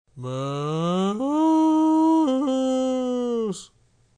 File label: moose call